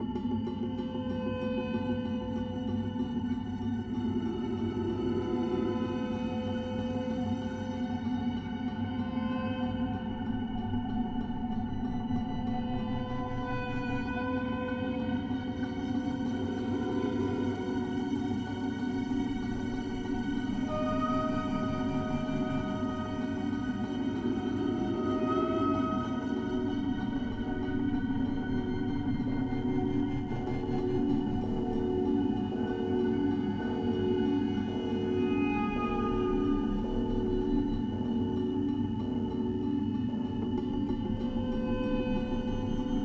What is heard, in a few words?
Music (Gameplay State Neutral) Mystery Amb; Music Hideout5; H5 Level Area=Lab